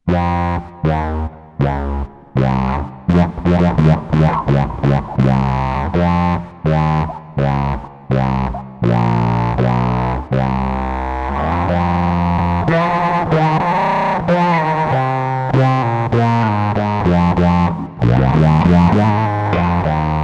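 BASS SYNTH, Zoom 506 II bass effects factory preset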